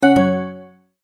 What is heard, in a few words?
multimedia tone end; McKinney Sound